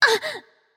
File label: grunt hit light; smite; voicepack; hel